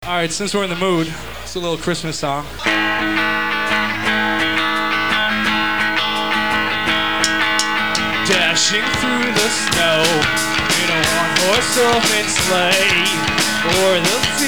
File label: jingle bells